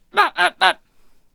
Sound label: Bomb Thrower Attack Vocals